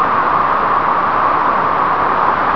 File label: buzz whrl, weapons buzz; chaos